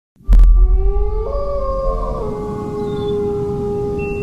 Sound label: wolf howl